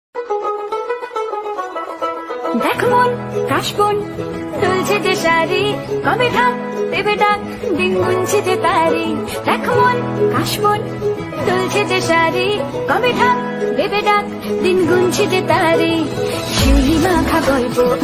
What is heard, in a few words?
bengali ringtone